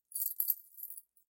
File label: Chain Foley; SoundsCrate